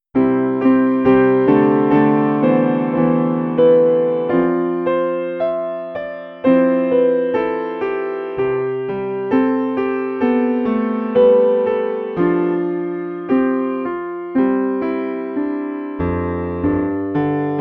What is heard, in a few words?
Grand Piano; PianoV